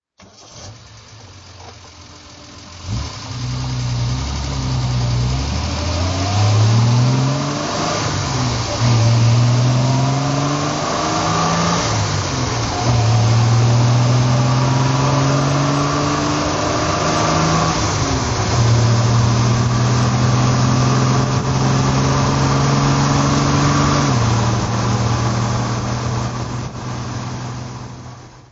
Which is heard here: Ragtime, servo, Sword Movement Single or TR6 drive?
TR6 drive